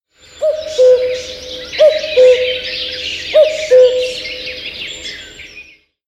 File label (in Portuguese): Sound Cancao dos Passaros Natureza; Floresta; Huawei P30 Pro